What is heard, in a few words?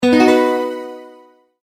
multimedia tone advance